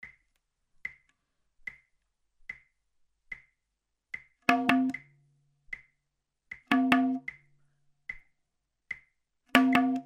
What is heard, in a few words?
HIGH; LAPIAZ 2